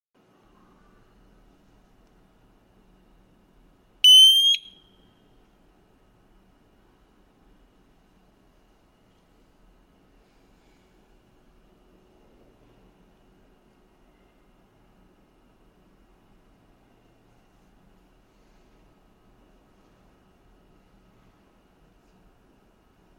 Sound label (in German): RM-L-3101 Stoerungssignal, rauchmelder